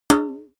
paint metal tin hit sing

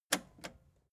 record player repeat click slow

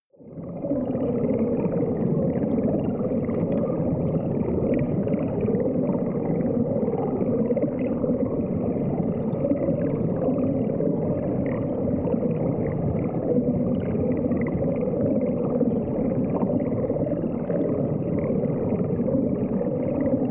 water fx spooky

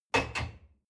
dishwasher cup place soft

